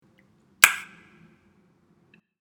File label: Pop Sound